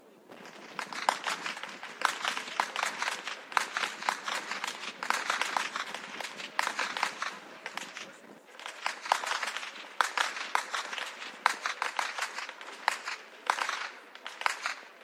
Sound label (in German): schnalzen, jugend; kirchanschoering; waging